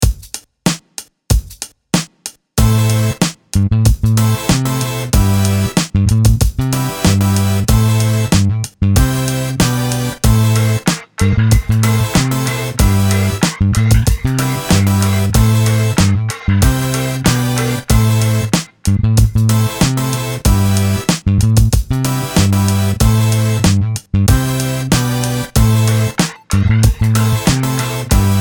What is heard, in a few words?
GROOVY SNEAKERS